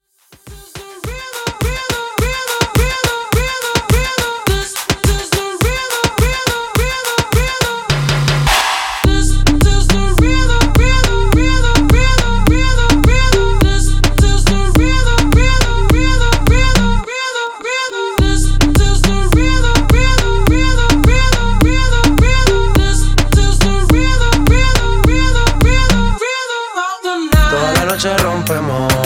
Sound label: Mash Up Blend